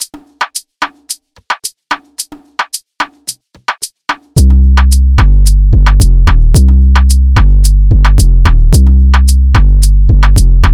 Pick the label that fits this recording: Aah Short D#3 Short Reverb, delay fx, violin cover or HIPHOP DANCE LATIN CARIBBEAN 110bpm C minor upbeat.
HIPHOP DANCE LATIN CARIBBEAN 110bpm C minor upbeat